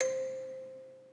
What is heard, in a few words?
musicbox